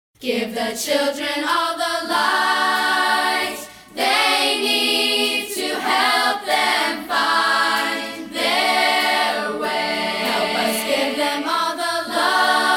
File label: Light A Candle A Cappella